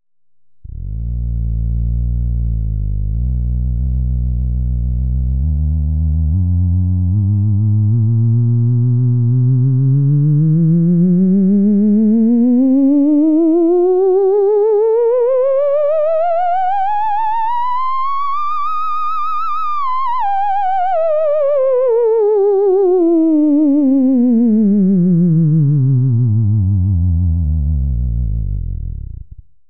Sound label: theremin